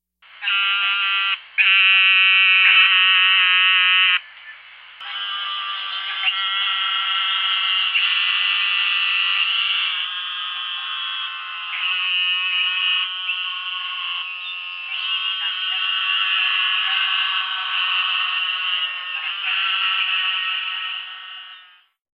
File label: Eastern Narrowmouth Toad call